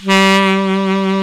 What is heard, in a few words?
TENOR SAX